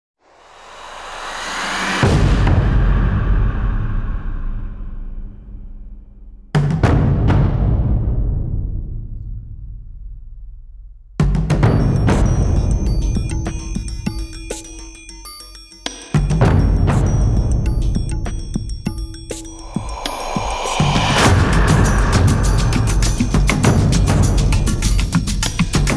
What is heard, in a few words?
drums